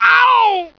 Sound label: pain; fgrunt